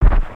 Stomp

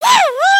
failed build; emotes

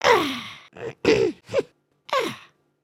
headspin, emotes

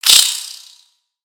Spider Attack Ranged; creatures mechanical; Lego

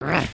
Walk, creatures organic

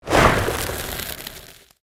Ice Shuriken Throw; Spinjitsu

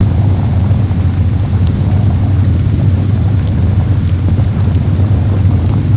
Lava Pit; ninjago

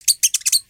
Pet Rabbit Speak; creatures pets

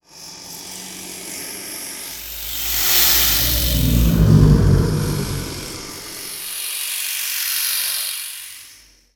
Serpent Hiss Loop Mono; destructibles

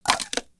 lion catch frisbee

